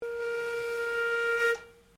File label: Steamtrain Whistle; Bellarine Tourist railway vehicles; Trains